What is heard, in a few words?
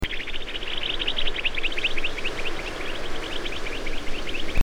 eastern rock nuthatch